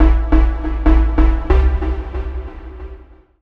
TECHNO EXTRA 140

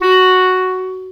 Alto Clarinet Portato F#3; VarazdinOrchestral

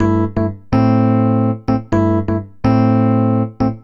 DEEPHOUSE ORGAN 125 A

